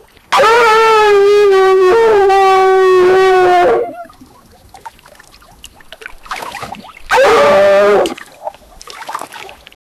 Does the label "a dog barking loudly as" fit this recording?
Yes